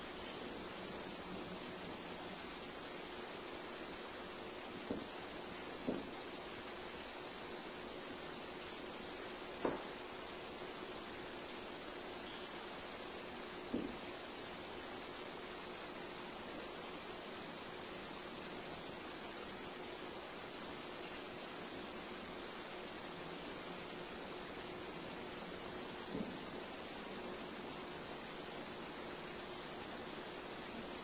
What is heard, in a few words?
footsteps